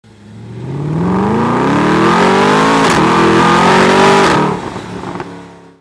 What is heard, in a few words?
sls amg you've got mail ringtone, sls amg email; gullwing c197 r197